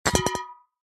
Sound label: soda cans tins empty bash together